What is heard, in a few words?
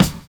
LAUGH; Univers Sons